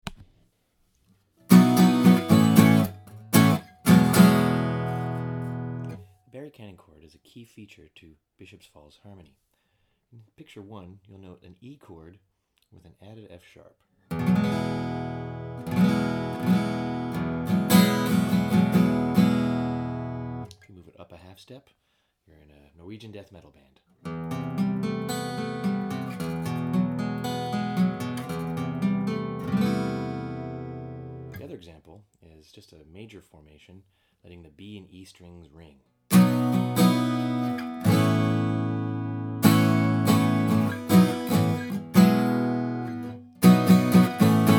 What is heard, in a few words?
chords